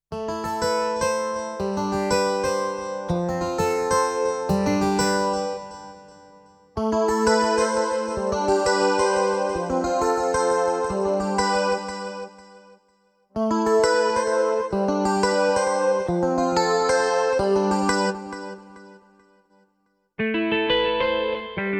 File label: Kompa H Guitar, haitian; MODX